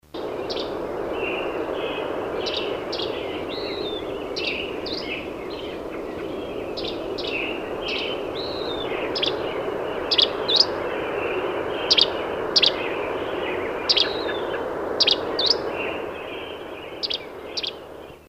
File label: Gray Flycatcher